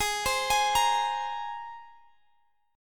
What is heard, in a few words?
Ab Major Add 9th, down, Guitar, Standard; arpeggiated